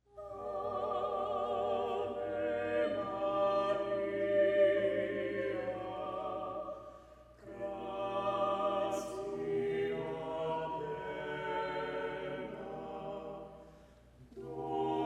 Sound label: Ave Maria, Choral SATB